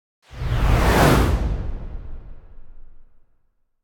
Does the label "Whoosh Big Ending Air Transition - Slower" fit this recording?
Yes